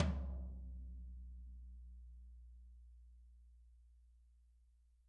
T14 MP L, TOMS; Living Drums; Sampleheads; Peter Erskine; PB KICK+TOMS

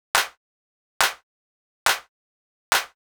drum Loop 140BPM Clap; Vengeance - Essential Clubsounds Vol.5; VEC5 Drumloops; VEC5 Multi Loops 140BPM

